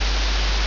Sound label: ambience room tone